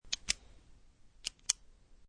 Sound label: Bic Pen On Off, Clicking sound of a ball point pen on and then off; Pens; Offices